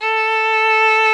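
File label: viola and violin, string quartet; 90s Sample CDs; Club-50 - Foundations Roland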